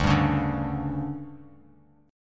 D Major 7th Suspended 4th, D, down, Bass Standard; DM7sus4; strummed; JGuitar

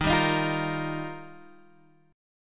Eb Major Add 9th Eb down Guitar Standard; Ebadd9 strummed; JGuitar

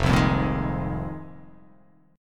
F Minor 11th, Fm11 Chord; strummed; 1,3,3,3,3,3,x,x; JGuitar

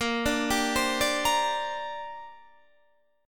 Bb 6th Add 9th Bb Guitar DADGAD

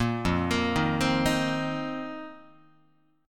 F 7th Suspended 4th F down Guitar; arpeggiated

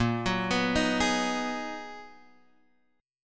B Major 13th A sharp

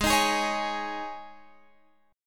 Ab Suspended 4th, Guitar Open F; strummed; Absus4; x,15,15,15,16,15

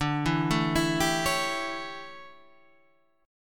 D Major 7th Suspended 2nd Suspended 4th, chord

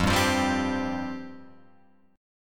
F Suspended 2nd F Guitar Open F; strummed; JGuitar

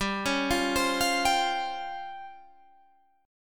C sharp Major 7th Flat 5th, chord

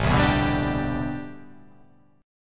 A Minor 11th A, Chord

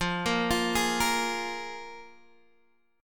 F Minor Double Flat 5th, Guitar DADGAD; x,8,8,10,11,8; down; chord shape

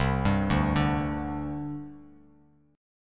C Suspended 2nd, C, down, Bass Standard; Csus2 arpeggiated; JGuitar

